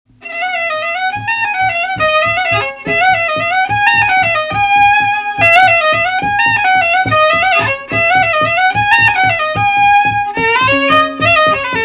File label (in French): Bourrée; Bourrée de Trémouille